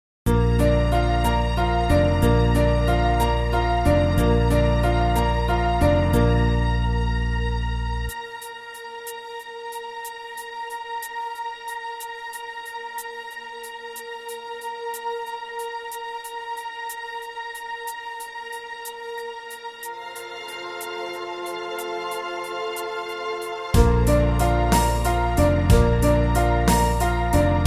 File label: brass minus